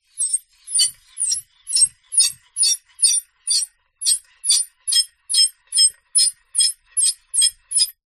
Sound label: foley metal sharp scrape squeak shrill circular movements; zapsplat